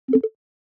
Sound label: voiceRecordingStarted